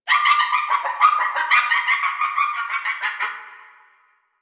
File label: Myna Bird; Warframe; The New War; Zariman; Apartment